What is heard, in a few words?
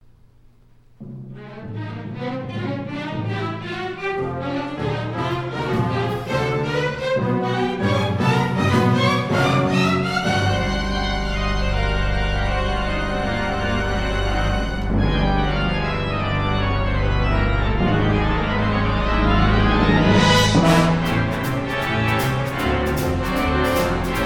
Boogie Pipes